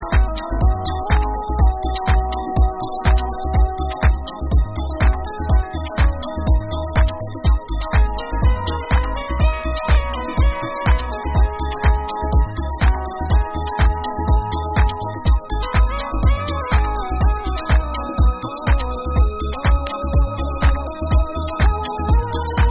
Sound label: Moog Mix